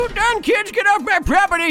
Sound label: dun